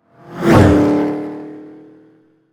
Pass By Fast; 快速驶过

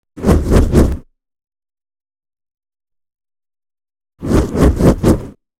Passing Objects; 通过物体

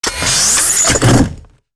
Gear Shift Power Move; 换挡引擎2

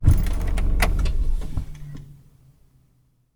Car Engine Turning Off; 熄火2

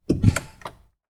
Car Pedal; 脚踏板

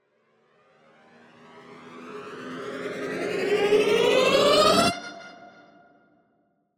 Space Race Riser; 速度上升

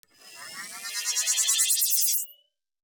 Speed Dial Riser; 速度上升2

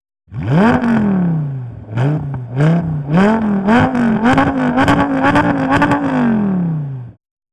Limiter Revving; 转速2

